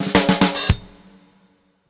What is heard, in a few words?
Fills 106; Zero-G; Monster Beats